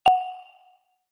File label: do high